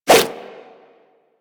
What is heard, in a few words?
Nunchuck Whoosh; Warframe; Infested